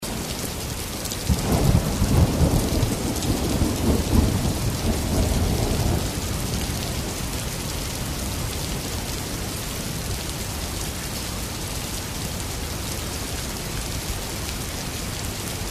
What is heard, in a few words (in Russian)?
dojd po asfaltu; шумом дождя, падающих капель